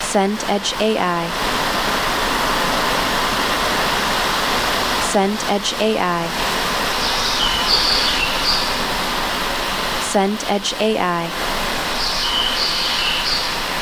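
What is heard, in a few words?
ambience suburb morning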